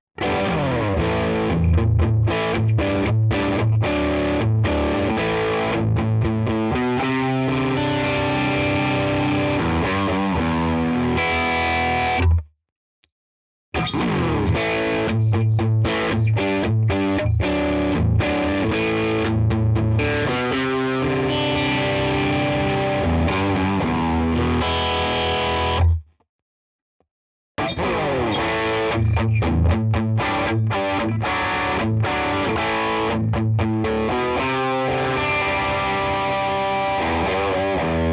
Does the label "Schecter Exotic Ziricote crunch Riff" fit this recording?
Yes